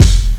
Kicks Loud

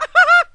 laugh cartoon; ooh ahh

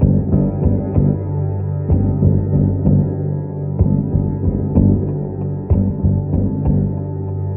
strings tragedy lurking; Loops; Sad

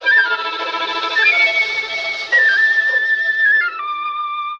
flute, Sad

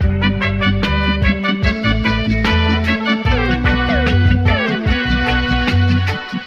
horns, phonky loops

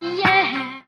"yeah" sung

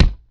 Kicks

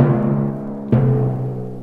two toms, drum loops

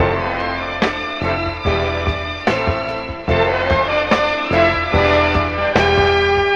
full of horns, Loops Phonky

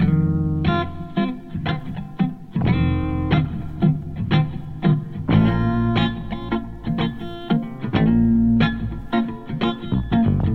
guitar, Loops; Phonky

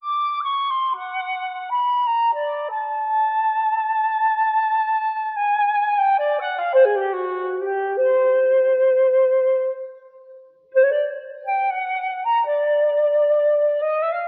flute wandering

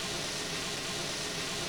tiptoe, Swish

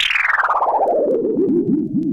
bubble down, zaps and beeps

